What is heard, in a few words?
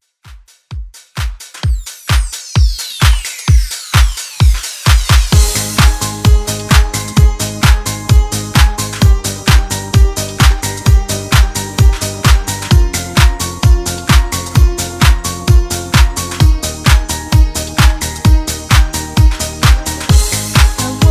Pop Redrum